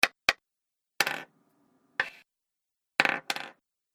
Game Piece, hit; Game Piece Soundpack; sound design

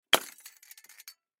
Lamp Break Drop, Foley Lamp Break Drop Cement; Lamps (Sound Effects:Household); Blastwave

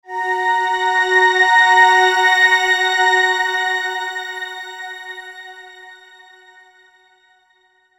Shimmer Flute Pad Swell High; Musical Sound Design; Blastwave

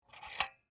Food Scale On, Object, Light; Household; Food (Sound Effects:Household)

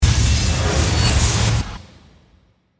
Force Power Burst, Break, Suction; BlastWave

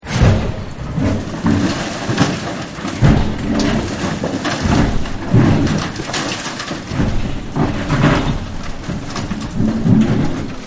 Banging On The Door; Bangs; Objects; Partners In Rhyme

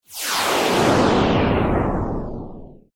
Whoosh Laser Descend; Whoosh, Ghostly, Breath, Horror, Production Element, Imaging Element, Accent, Transition; BlastWave

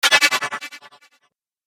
Laser Shatter; Laser Sound Sound Effects

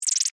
Insect Voice Chirp, Vocalization, Bug; Animal

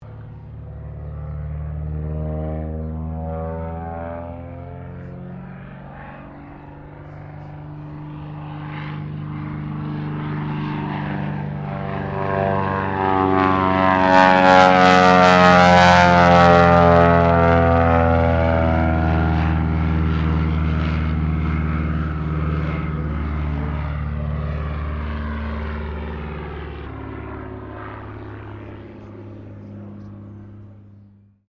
AIRCRAFT CESSNA O-1 BIRDDOG PASS; Cessna Birddog spotter aircraft; Airplane Sound Effects; Airplanes (Sound Effects:Vehicles)

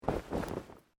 Clothing Shirt Cotton Move

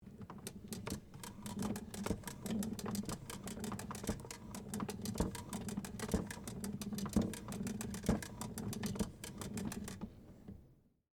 Phonograph Crank, Hand, Crank, Turn, Machine; Technology, Phonograph; 1896, Edison, Presentation; Miscellaneous Electronic Sound Effects; BlastWave

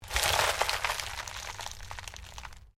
ORGANIC TWIST; Various Organic Foley sounds; Knives; Combat

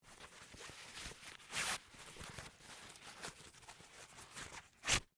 Karate Belt Tie, Sports, Karate, Belt, Tie; Miscellaneous Sports

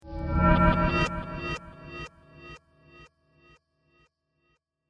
Horror Scifi Fx; Sci Fi Sound Effects; Andrew Fleming

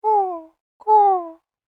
Crow Animal Impersonation; Bird Sound Effects

